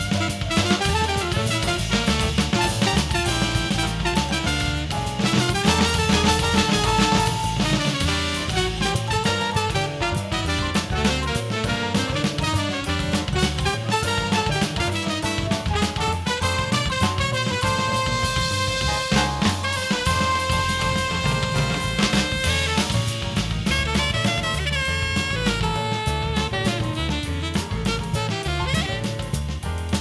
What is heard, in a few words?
jazz